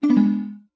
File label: Pause Menu Save No; Do not save your game (Recorded)